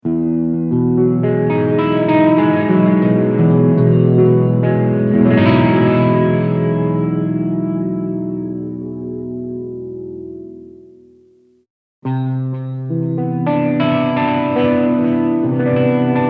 accordi di partenza

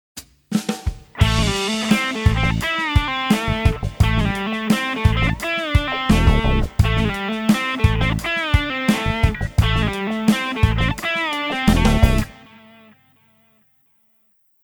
Pentatonica minore con tre note; Recycle 4; Vince Carpentieri

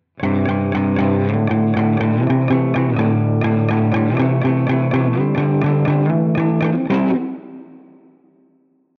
Esercizio, ARMONIZZARE la pentatonica; Esercizio 1; Pentatonic Evolution 28; Jerry Arcidiacono

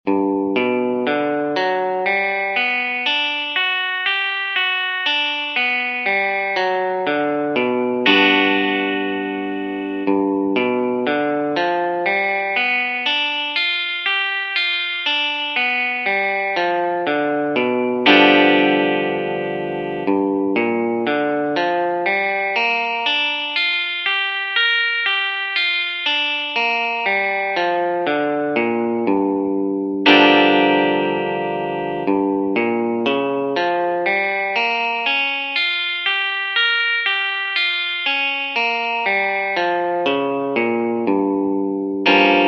arpeggi in Sol; Guitar Club Febbraio 2026

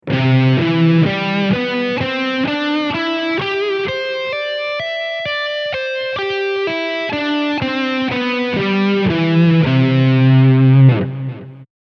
Do maj9, guitar

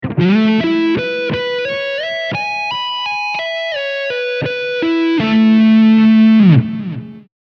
La maj9, guitar

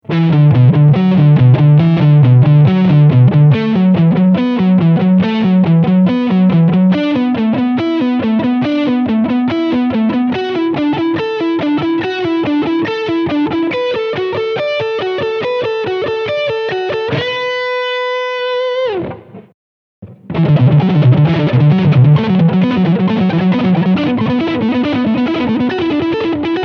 Pentatonica salto di corda; guitar club n1 2015

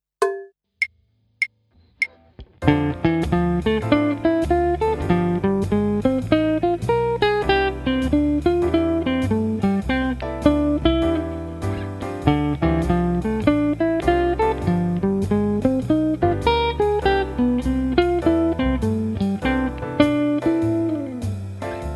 Jazz Guitar modi, Scala maggiore; Esercizio 1; Jazz Guitar 4; Stefano Coppari

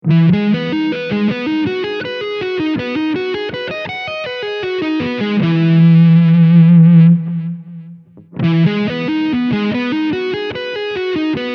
Mi arpeggio, guitar; guitar club n2 2015

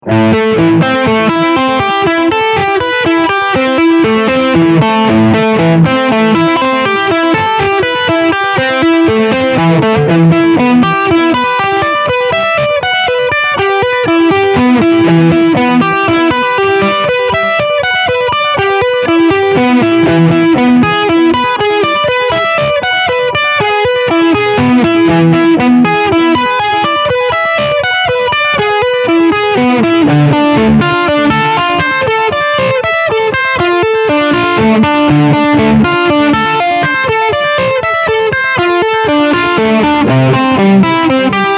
applicazione su accordi

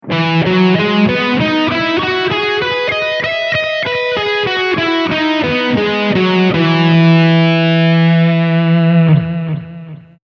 Mi maj9, guitar

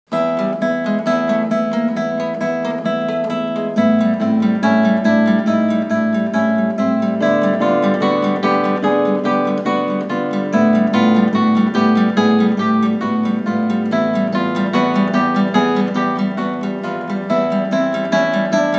La tecnica della mano destra, chitarra sola; Esercizio 1; Guitar Club Dicembre 2015

